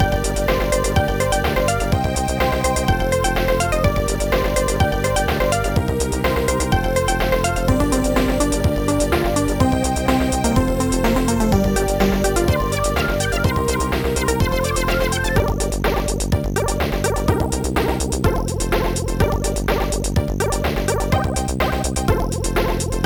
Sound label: fm noise; Falcon (FI); Trackers; Modland